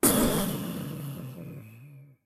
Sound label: splosions; SyrinScape; Syrinscape unplugged; Jungleplanet dinolaser battle